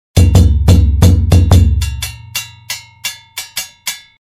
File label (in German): Dundunba; Instrumente